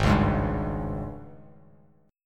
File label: G sharp Major 7th Suspended 4th, Bass Standard; strummed; 4,4,6,0